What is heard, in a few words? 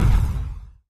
Shock Rifle Beam Impact; rifles